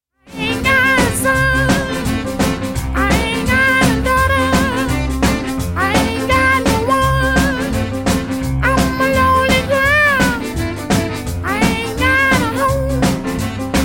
frogs cicadas geng song duo gamelan geng song; Geng Gong Duo

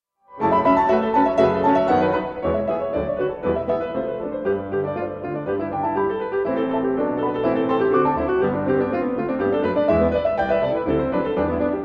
for 2 pianos

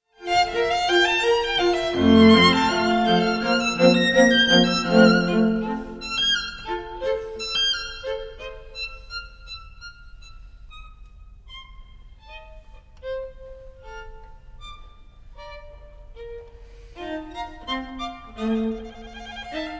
string quartet in D major; ludwig van beethoven; op18 no3

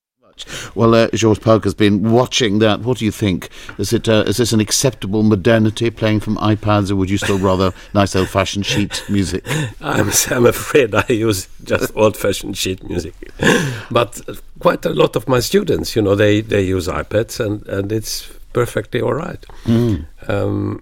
string quartet in G minor 1st mvt

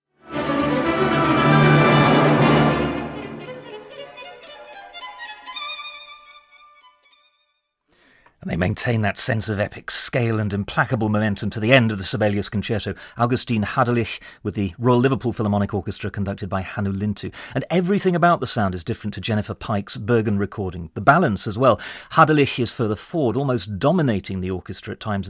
concerto in d minor for violin and orchestra; op 47; mvt 3 opening

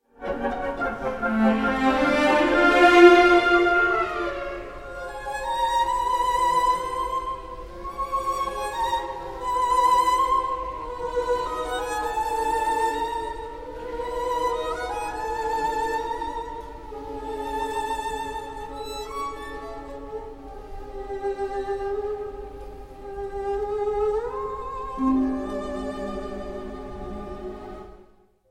orchestra tone poem; sir granville bantock; witch of atlas; tone poem no 5

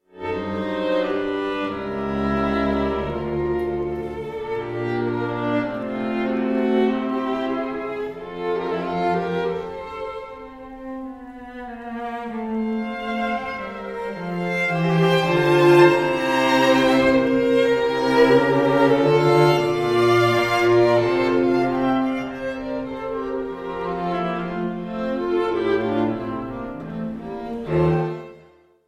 string quartet in C major; wolfgang amadeus mozart

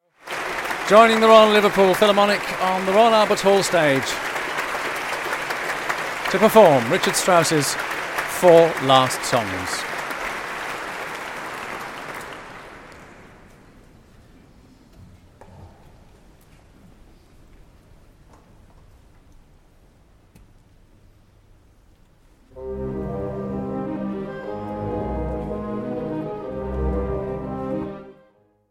for voice and orchestra; richard strauss; 4 letzte lieder

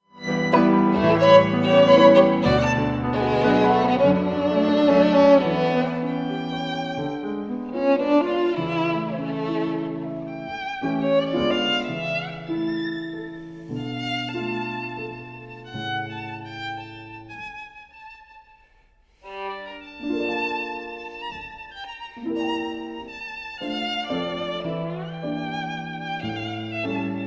5 pieces op 81 for violin and piano no 1 mazurka

